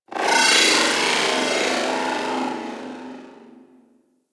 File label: Ship Robotic Random Calls; Ambience; Warframe; Corpus Ship Remaster